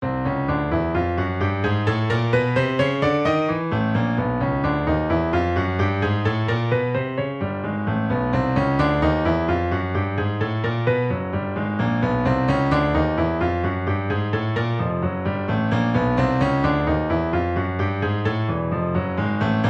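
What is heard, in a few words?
horror and horrible piano music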